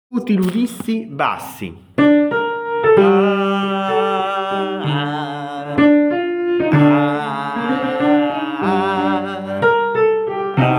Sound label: bassi, coro